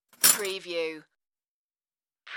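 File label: Silverware Drop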